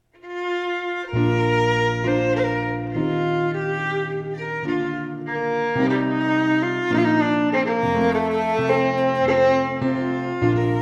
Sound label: Strathspey, FIDDLE PIANO; John Macglashan col 1797